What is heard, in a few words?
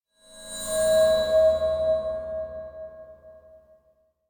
Cymbal Bowed Metallic Tone High; Hollywood Edge; sound designer's toolkit v2